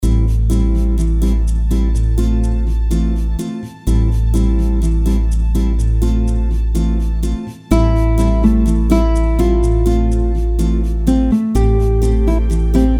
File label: Easy Summer Bossa